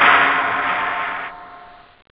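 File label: Ending open hi-hat; jazz jackrabbit 2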